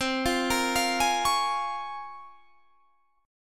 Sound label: Bb Minor 7th C; Bbm7/C arpeggiated